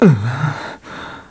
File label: Dizzy; Link turned dizzy